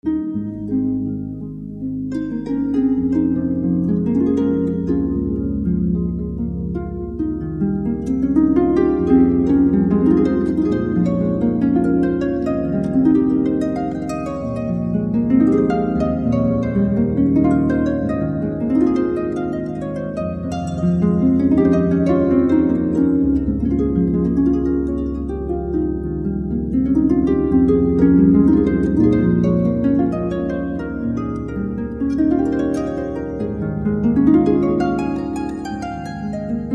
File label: Harp, Wedding Music; Harp Angel